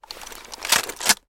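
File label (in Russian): убранный в сейф пистолет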